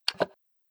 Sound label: doc click